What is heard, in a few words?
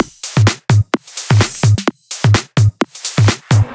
Dirty Dutch Loop